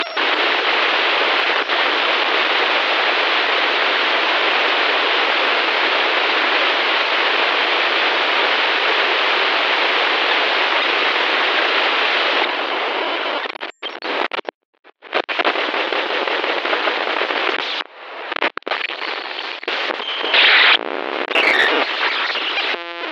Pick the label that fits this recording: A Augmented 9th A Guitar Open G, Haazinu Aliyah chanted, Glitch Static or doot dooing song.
Glitch Static